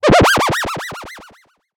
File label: hard rubby; sound effects